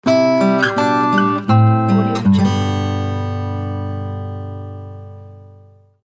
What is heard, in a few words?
guitar logo; mojoproductions